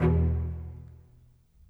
Bass Cello, Orchestral; Sonic Foundry (Sony Creative Software); Orchestral VOL-2; Modern 13

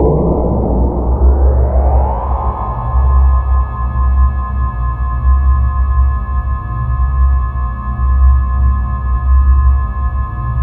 EFFECT, Explosive Jungle; USB Soundscan vol.05; AKAI

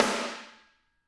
SD, Drums; Bob Clearmountain Drums I

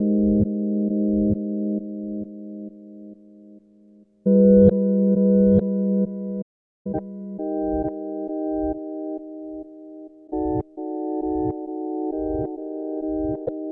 Keyboard

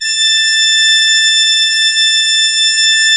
A5, Cathedral Organ; ILIO; Propeller Islands Cathedral Organ

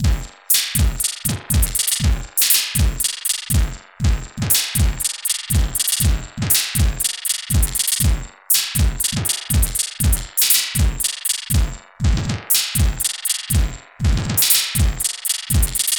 Structured Rhythm, IDM Rhythms; Sonic Foundry (Sony Creative Software); Twine-IDM

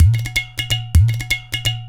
Udu Pot; Roland BK-5; Loops & Phrases

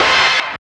RETRO HIT; Spectrasonic Distorted Reality 2

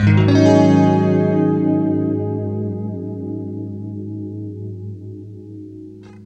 FLESH CHORDS, Guitar

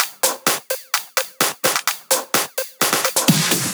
Drum Loop 128BPM HH Clap; VEC5 Drumloops; VEC5 Multi Loops; Loop Elements

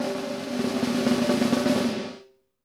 ROLL, PROC SNRS, Drumworks; Sampleheads; New York City Drumworks VOL-1

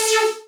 technosyn2, Techno Trance; Ueberschall; SYNTH

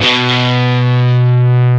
DIST GT1-B1; AKAI S-Series CD-ROM Sound Library VOL-8

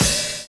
DJ FILL

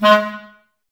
clarinets, Wood Winds

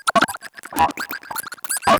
Droids

